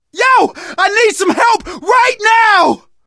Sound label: dying, survivor voice, manager; source engine; left 4 dead 2